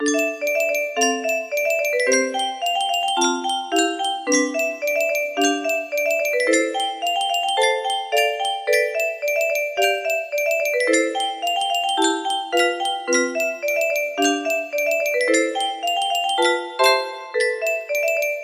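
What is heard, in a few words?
15 note version, music box melody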